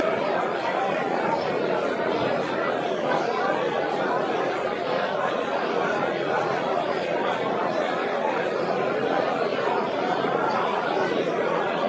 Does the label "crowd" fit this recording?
Yes